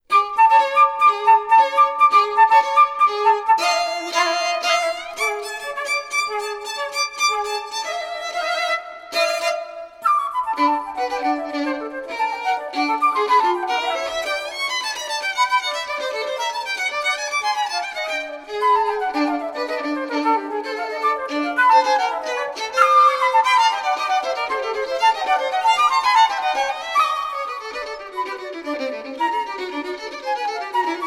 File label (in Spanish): samba